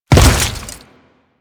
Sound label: Step Goo, Footsteps; Enemies; Warframe; Grineer